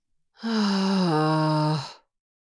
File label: Adult female disappointed moan